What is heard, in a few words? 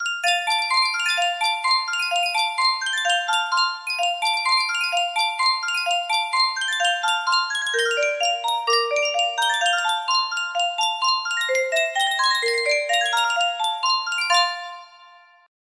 Yunsheng music box, music box melody